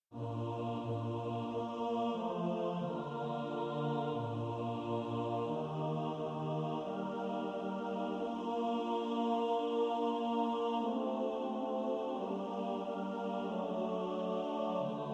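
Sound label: Who is on the Lords side bass, choir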